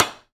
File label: Plastic Ladle, household rhythm samples hits